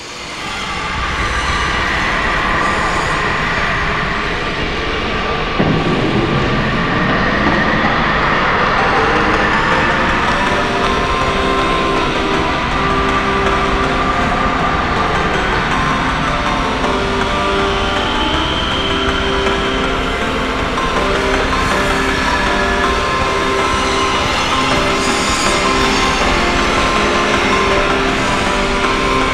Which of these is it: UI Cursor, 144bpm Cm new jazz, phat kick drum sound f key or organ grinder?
organ grinder